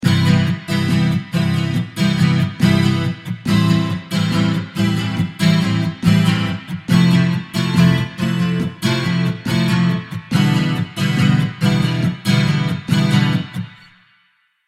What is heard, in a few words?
strumming pattern